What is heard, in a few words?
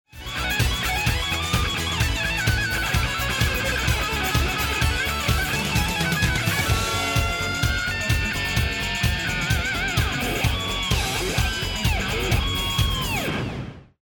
choose team; music kits custom